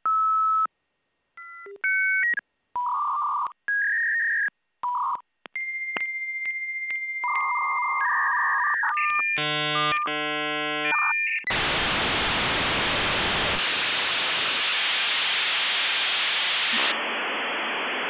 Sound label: k56flex